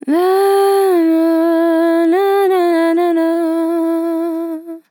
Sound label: VOCAL FILLS 120BPM Am, nah nah